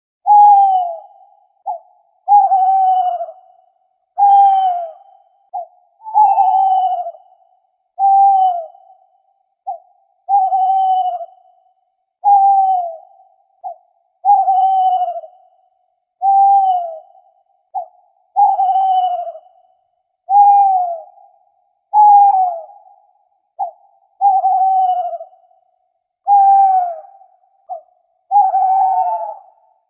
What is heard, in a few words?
Owl Hooting Ringtone